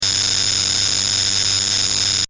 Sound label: Laser: Cutting Object; loop; Lasers